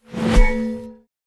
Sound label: Spear Whoosh; Imperius